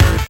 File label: kick